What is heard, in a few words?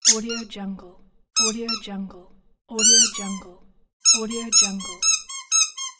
rubber duck squeak